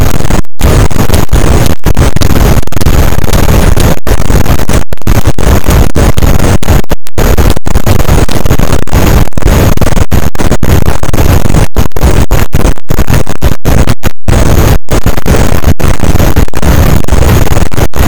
cachalot positif, boat; Physeter Macrocephalus

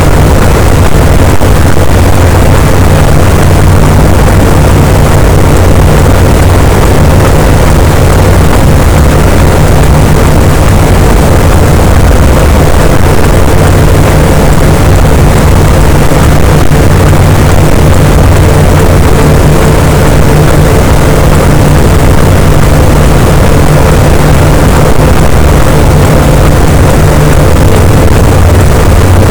cachalot, boat; Physeter Macrocephalus

